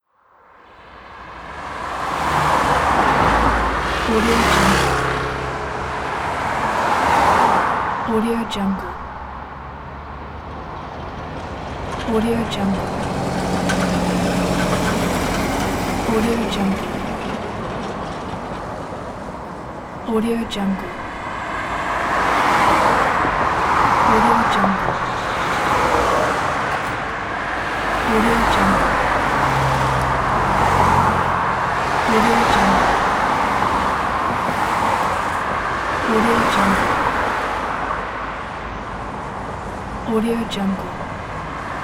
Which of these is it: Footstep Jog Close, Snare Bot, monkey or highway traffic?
highway traffic